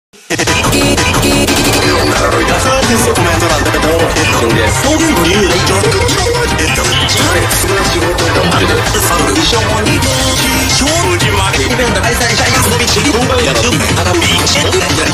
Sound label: rap